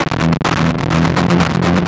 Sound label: Synth Sequence; Eclectic Electronica